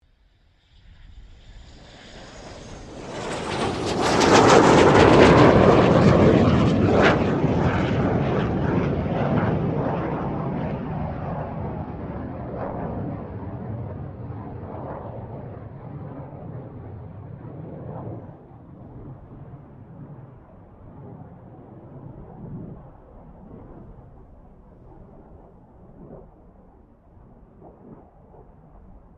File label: FA-18 Hornet pass; RAAF FA-18 Hornet; Airplanes